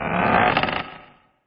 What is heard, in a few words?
Coffin Chest